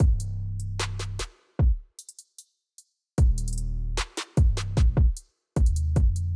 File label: drums 808